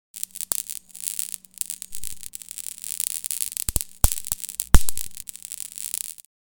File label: Taser gun electrical noise